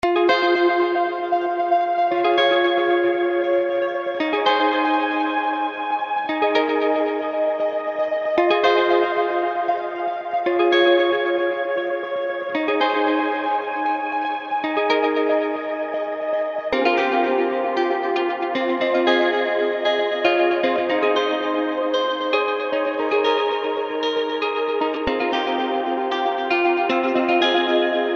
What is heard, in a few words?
Ambient delay